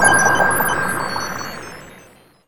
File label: recon ping; tf2